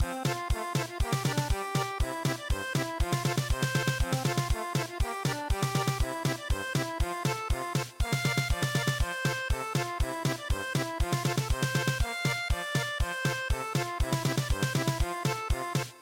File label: German Polka; Holdiridia